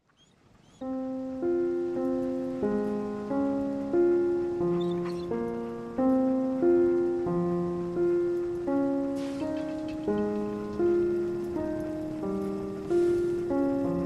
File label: Piano; FO4 Misery Island